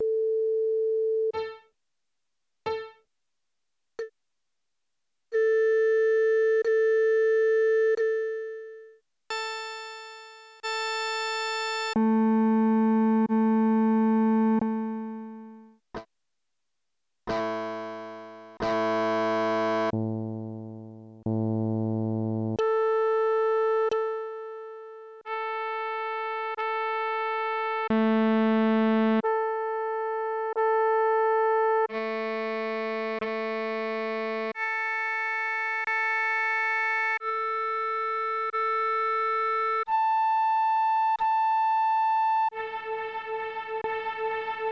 Instrument Test; Satellaview BS-X

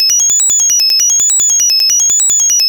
Twinklies; Cool Spot (Genesis)

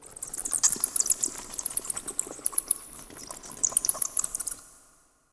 bats squeaking

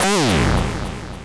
battle enter; Deltarune

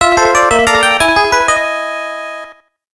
Unused Jingle; EVO

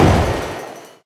spell hit

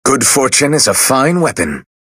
LV UP; Fire Emblem Echoes SoV; Fernand

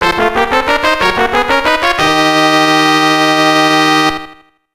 Unused Jingle; EVO

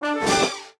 TEMPLATE FLASHES; DTL Proto4

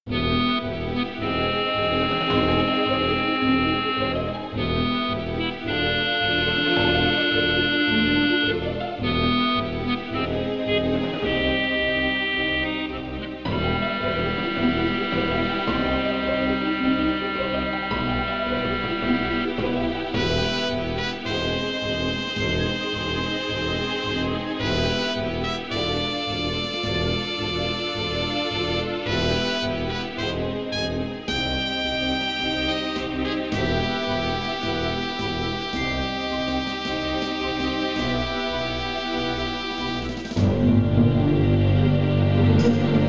Music SNEAKING; Daggerfall

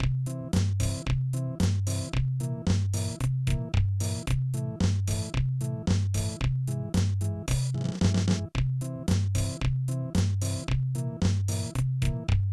Unused Super Scope Test Song; SNES Burn-In Test Cart

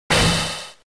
Drum

